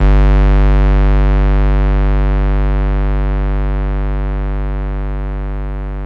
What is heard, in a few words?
Synare Sub Buzz Vinyl Synths G0, bass